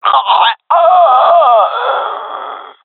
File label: death, jungle fem; vo; agents; Source Model Packs; Counter Strike Model Pack; CS2; jungle fem epic